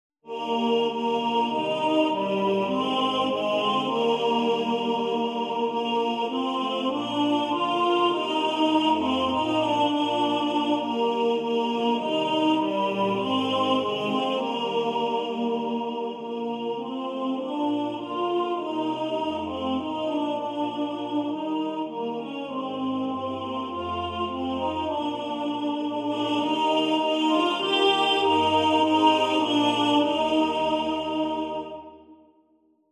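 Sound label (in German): Tenor 2 im Vordergrund, Männerchor; Jesus Christus Gottes Sohn; Satz Karl Wezel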